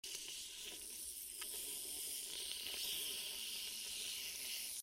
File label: squeeze bottle; gamerip; Papa's Freezeria